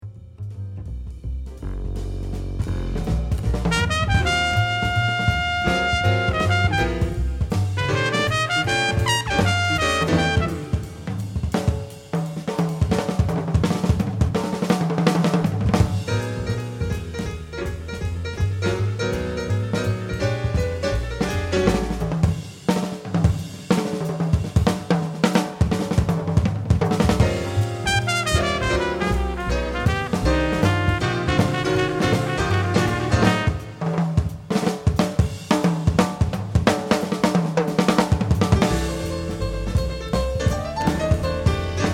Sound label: Drums